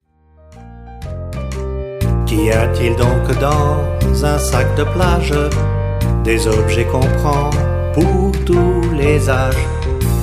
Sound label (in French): Chant